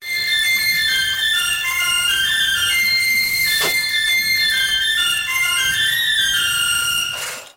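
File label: Songbird Call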